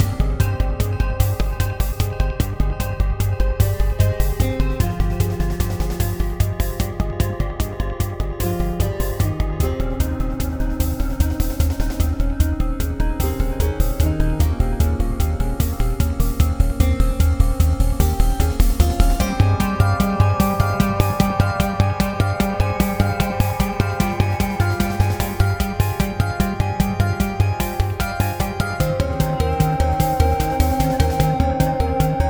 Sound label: Fire Boss; Ittle Dew